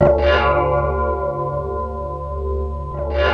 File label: GLASP; East West - Global Sounds